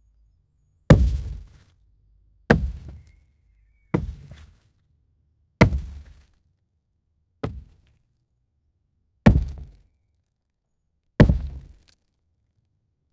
a stone impact on rolls; crashes

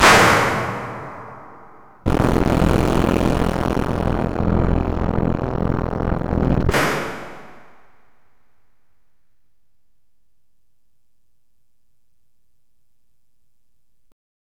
the loud bang of, guns

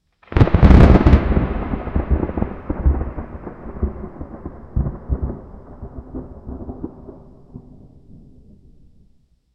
constant sky ripping

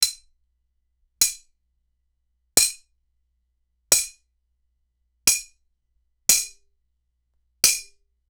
realistic lego spatula, kitchen

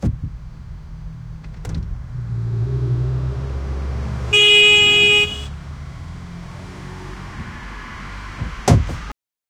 a car honking and swervin; annoyance

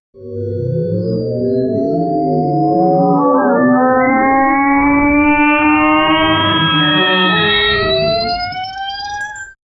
sales growth rise up, business

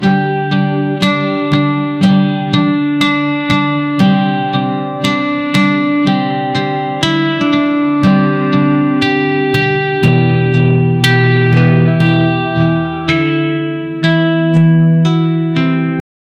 guitar romantic melody

